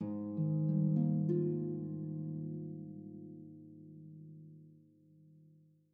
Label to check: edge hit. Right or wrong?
Wrong